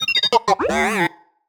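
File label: Sci-Fi Droid Robot Emote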